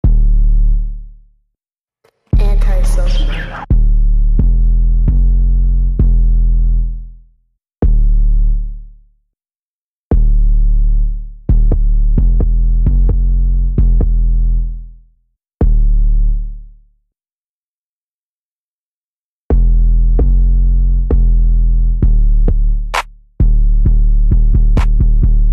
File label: Instrumental; reprod AntiSocial